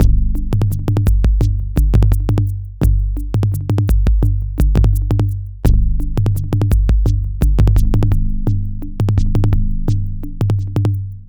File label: Electro Shaman, Tribal Fusion; Ambient Cinematic